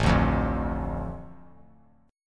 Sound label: A Suspended 4th Sharp 5th; Asus4#5 strummed; JGuitar